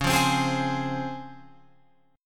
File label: Db Major 7th Db down Guitar Open F; strummed